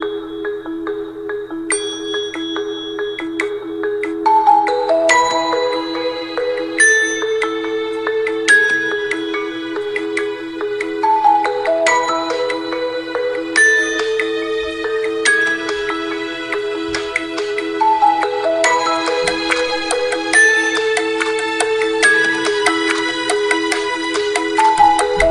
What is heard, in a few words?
honour ringtone